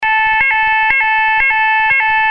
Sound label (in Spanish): sonido electronico; 24v cc